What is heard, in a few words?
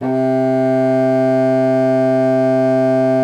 C3, bassoon; Xsample VOL-6